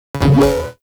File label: Rifle Projectile Pre Explosion; Warframe; Weapons; Corpus; Ruban